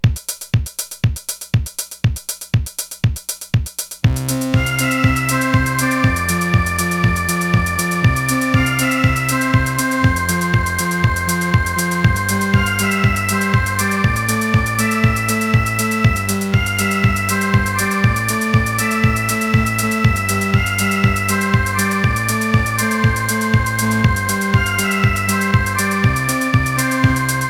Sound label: marconi instrumental